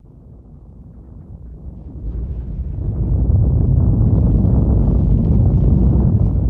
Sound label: Earthquake Rumble